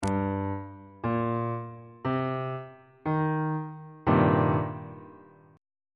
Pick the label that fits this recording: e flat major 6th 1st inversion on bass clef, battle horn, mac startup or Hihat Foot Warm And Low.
e flat major 6th 1st inversion on bass clef